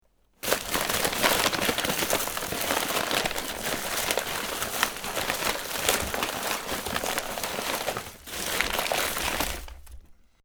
wuehlen stark

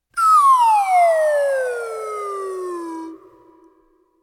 Instrumente Gleitpfeife Langsame 3s Glissando Abwaerts

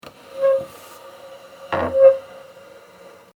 Holzstuhl auf Fliesen schieben

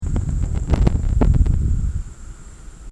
Rueckwaerts Gas

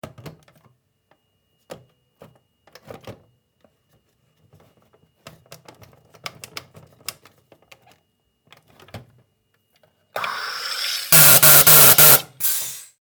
Soda Automat

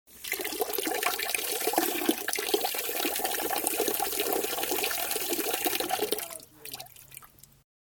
wasserhahn im garten

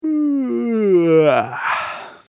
vox gaehnen

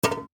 S Bahn Muellklappe

